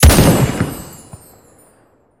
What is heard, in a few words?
weapon; Akaiittou VII